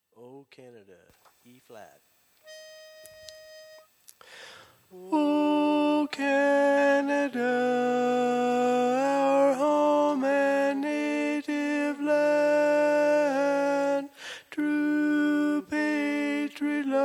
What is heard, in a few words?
O Canada Tenor